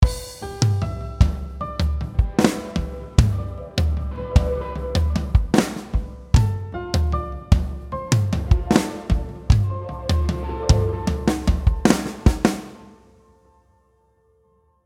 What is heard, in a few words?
mel variation; develop your musical ideas